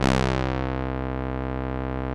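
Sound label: Juno brass, analog synths; 90s Sample CDs; Club-50 - Foundations Roland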